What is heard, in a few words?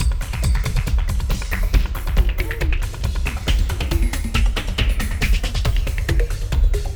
Clubbin' 138